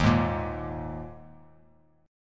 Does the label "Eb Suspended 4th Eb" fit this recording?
Yes